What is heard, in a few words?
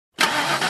Car Engine ignition isolated